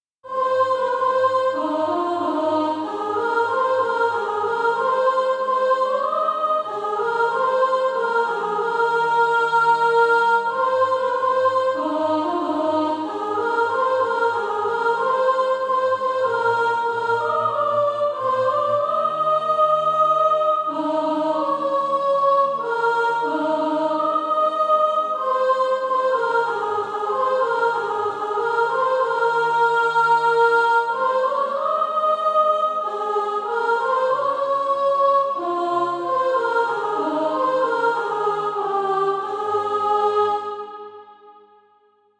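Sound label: soprano; Dew of Mercy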